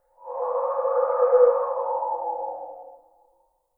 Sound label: Cool Wind